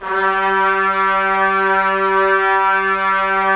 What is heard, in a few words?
Trumpets; Roland - LA Composer vol. 4